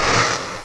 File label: extinguish; Odyssey Demo